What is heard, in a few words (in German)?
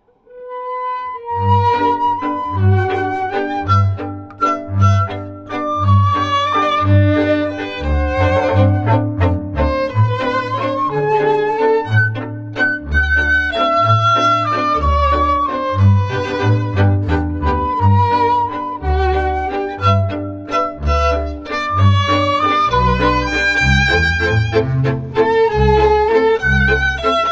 Marsch